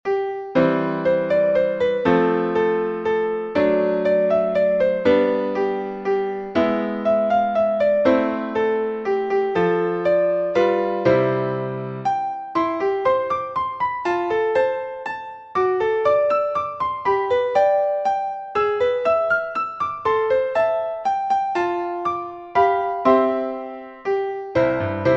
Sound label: Piano